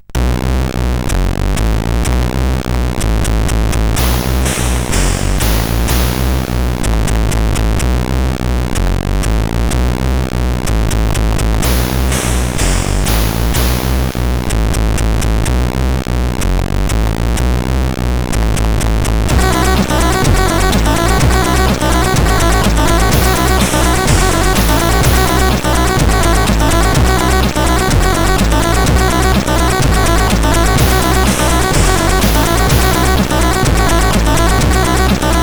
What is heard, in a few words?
Stormy Night, sid CSG8580R5